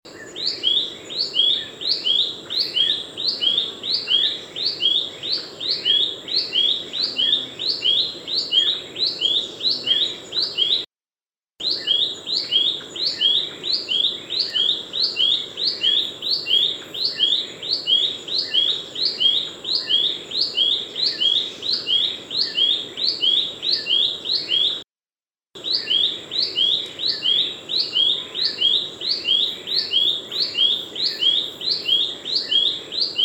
suara kicauan buff-breasted babbler

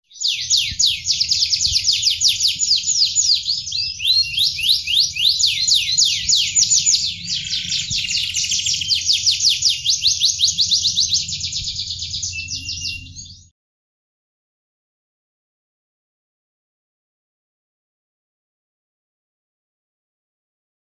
audio kenari original jeda 30 detik; nagasari

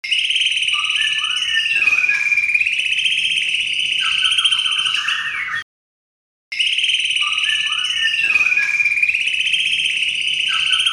tembakan andalan burung murai batu; natalia

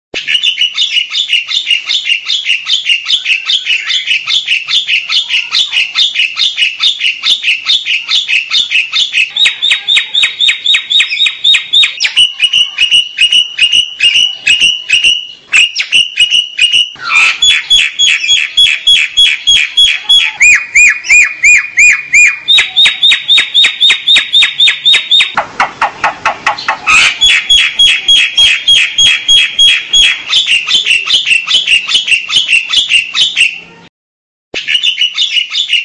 jalak hongkong

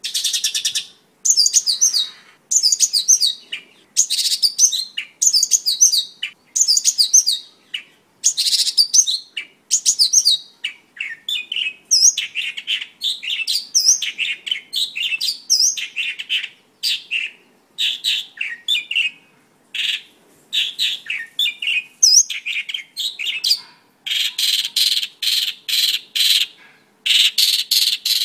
kicau master pakling

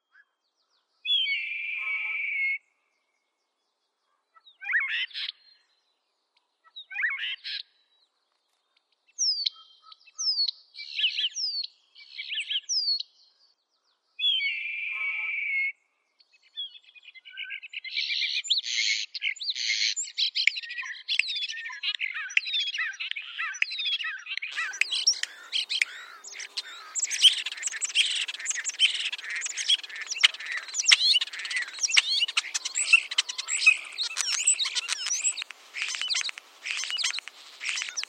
jalak eropa suara elang

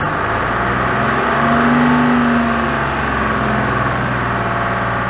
suara mobil

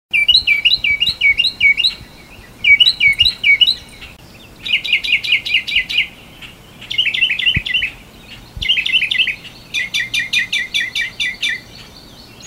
kicauan burung northern mockingbird

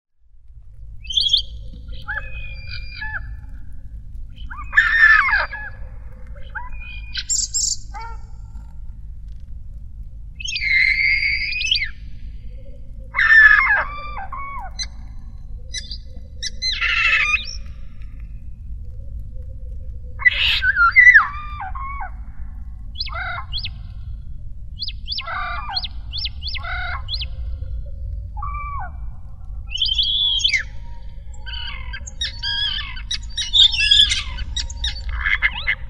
jalak eropa variasi

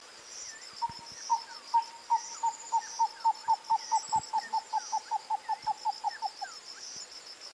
burung bubut

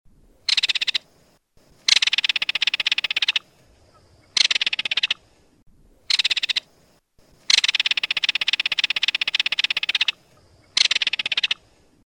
masteran belt kf

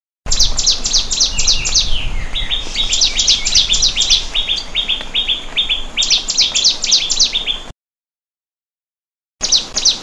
suara burung prenjak lumut

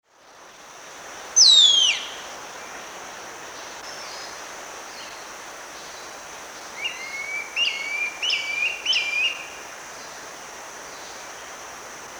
burung madi hitam

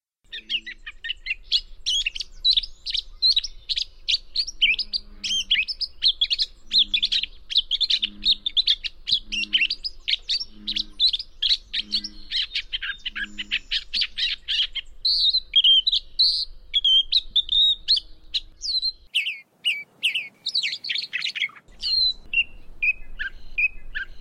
masteran untuk lark

